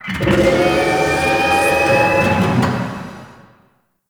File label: Machine Lift; Ambience; Warframe; Corpus Railjack